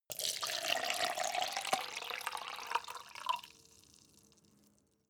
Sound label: cup plastic pour